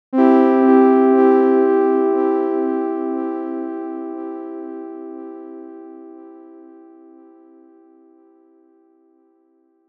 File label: ping pong delay test